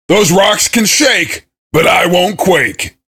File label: cheer; svencoop; voice commands; SeriousSam